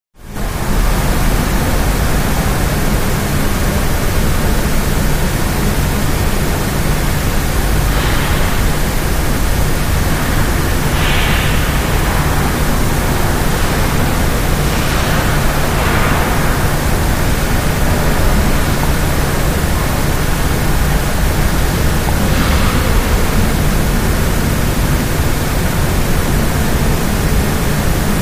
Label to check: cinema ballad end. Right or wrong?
Wrong